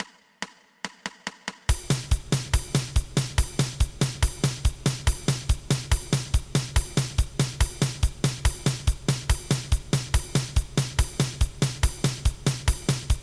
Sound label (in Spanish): 2 pulsos